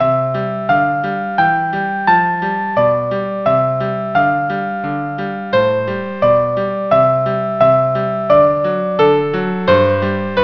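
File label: violin cello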